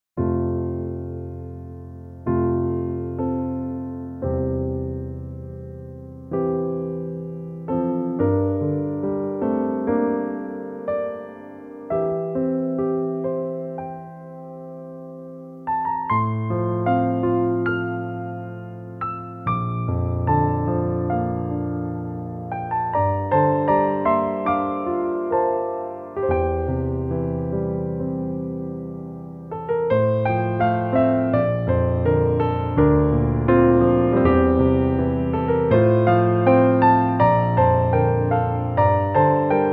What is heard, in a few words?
Piano warm